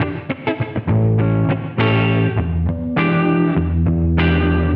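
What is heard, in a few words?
distortion guitar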